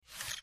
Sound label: Short Squeaky Mouse